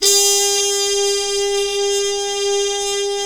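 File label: BRS, Harmon Trumpets Section; Roland L-CDX-03 Disk 2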